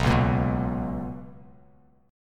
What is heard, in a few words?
B Major 7th Suspended 2nd Bass Standard, chord